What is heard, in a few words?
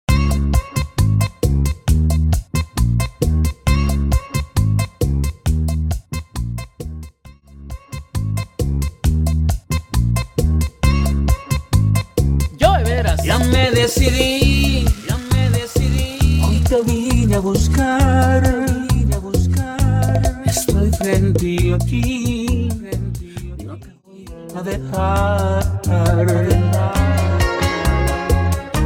Intro Acapella Outro 134BPM; Dj REsident; Specializing in high-energy remixes across Latin, EDM, and urban genres